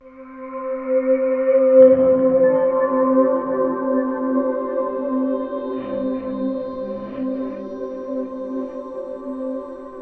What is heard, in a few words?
Digi Pad C, Ambient; DigiPad 2; Trance Formation